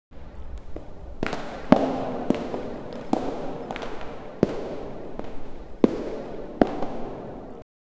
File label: heavy cathedral footstep